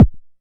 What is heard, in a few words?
low, Foundation Drums; Ableton Foundation FreePacks